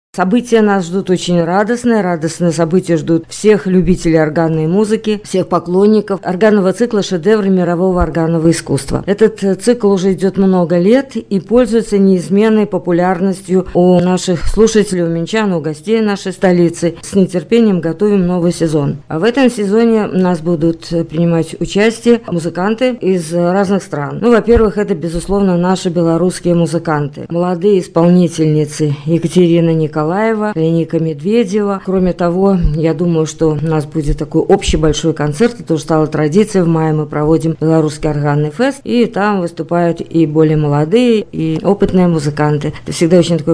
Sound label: organ